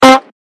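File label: tether projectile loop; billy ed edd n eddy sfx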